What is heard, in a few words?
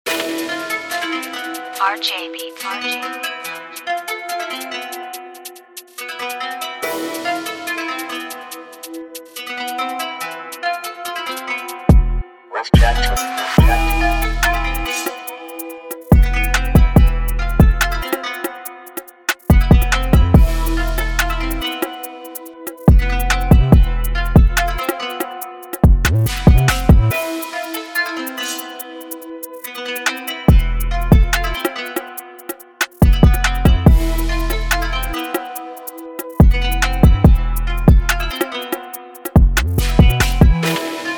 UK-DRILL